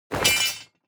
object metal drop blade small

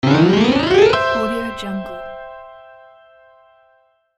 piano glissando correct answer

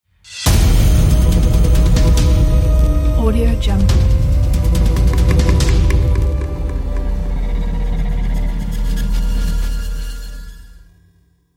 scary logo; soundpole

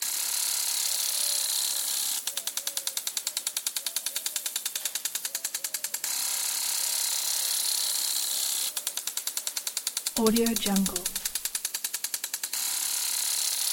household water sprinkler loop; blazznet

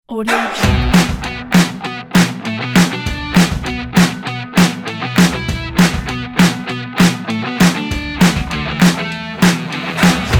sport and motivational rock

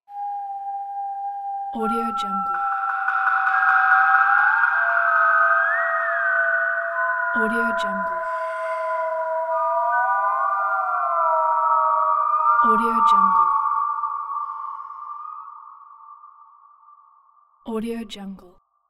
spooky whistles with woodblock hits

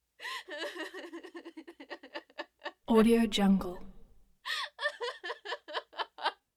cries whimpers; prosoundfx

